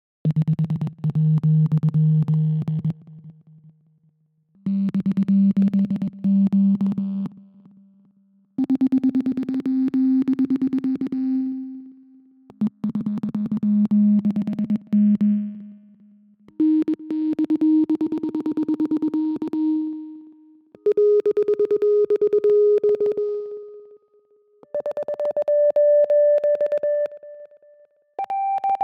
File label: Radio Morse Code SOS